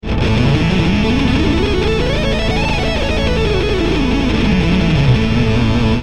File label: lydian fast